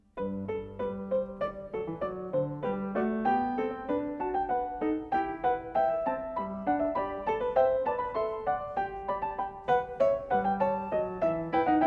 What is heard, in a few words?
Variatio 9. Canone alla Terza a 1 Clav.; 1981 Recording